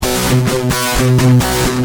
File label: Guitar Heavy, Industrial